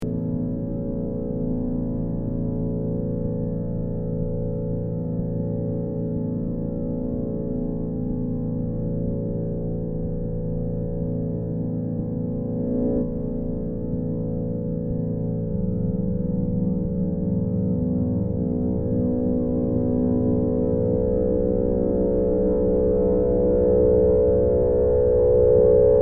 Drone

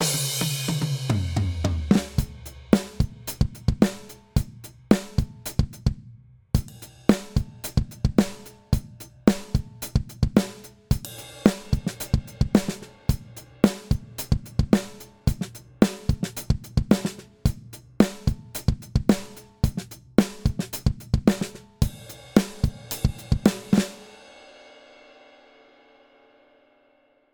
Drums Power Gate EQ Comp; Antelope Discrete 4

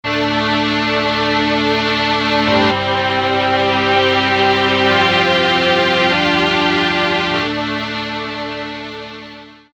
strings

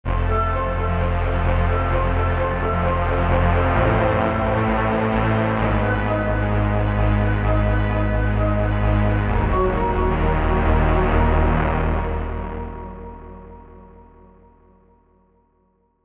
Tape Arpeggiator; Heavyocity Mosaic

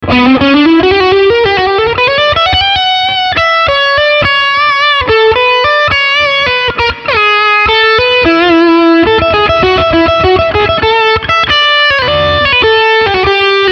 Med Gain Lead

